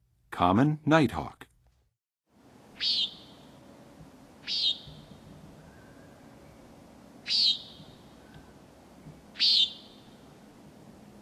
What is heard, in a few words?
Common Nighthawk, Songs of Birds; North America; Western USA; Western USA Songbirds